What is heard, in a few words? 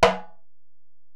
Tom, Alesis DM-PRO, Drum Machines, Toms